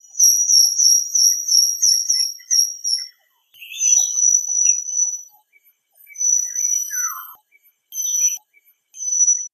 White crowned Forktail calls, Ulu Kinta Forest Reserve Ipoh Perak Malaysia